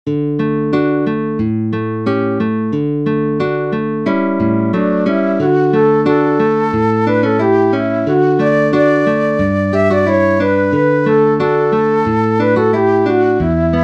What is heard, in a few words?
Flute Guitar Duet in D Major